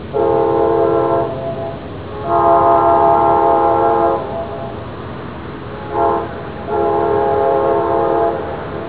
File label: amtrack